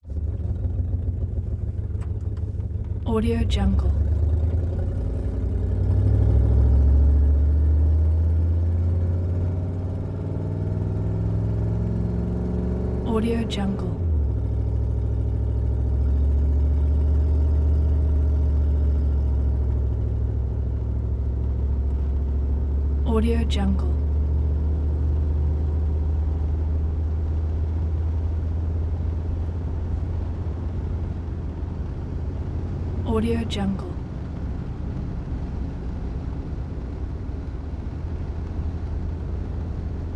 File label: 1968 chevy caprice car int; prosoundfx